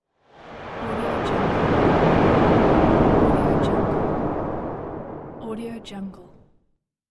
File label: facing death